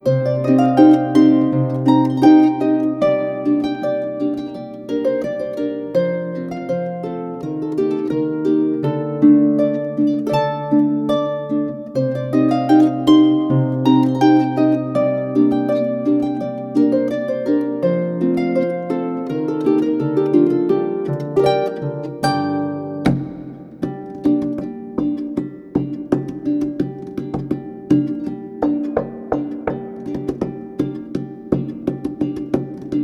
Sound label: balkan